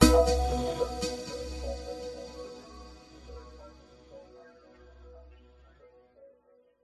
multimedia musical logo branding signature percussive hit into delayed pad drone